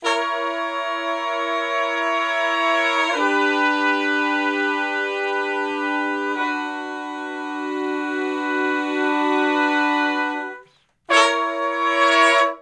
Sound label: Slo Minor Blus Chords (V,IV,I,V) (B), Horncraft; Sections; Crimson Blue and Fabulous Horncraft 4 RnB; Horncraft for R&B